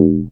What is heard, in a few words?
DR. LOOP, BUZZ; X-Static Goldmine 3